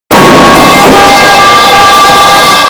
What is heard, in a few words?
pipe falling very hard